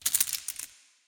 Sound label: bonus; Alawar; Crusaders Of Space 2